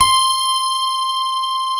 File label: PF C 5 -L, GRAND PF; AKAI S-Series CD-ROM Sound Library VOL-7; D.GRAND PF